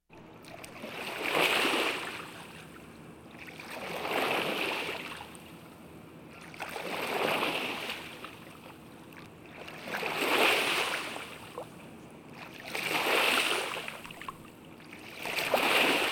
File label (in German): Sport Paddeln